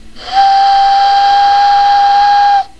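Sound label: train whistle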